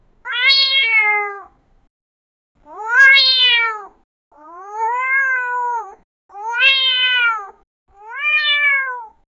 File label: cat sound